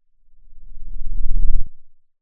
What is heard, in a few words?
pop